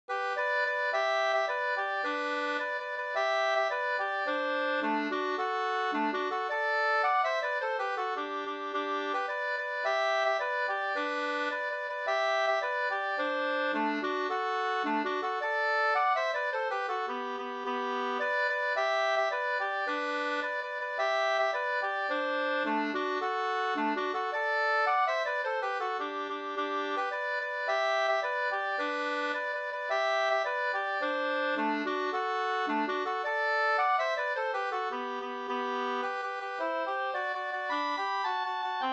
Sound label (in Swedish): polka, 2st